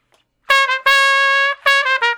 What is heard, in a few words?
Trumpet Shuffle (Bb); Crimson Blue and Fabulous Horncraft 4 RnB; Horncraft for R&B